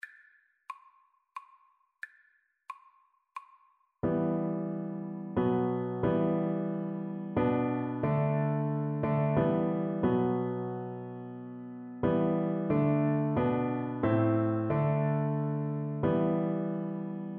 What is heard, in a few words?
coventry, Tuba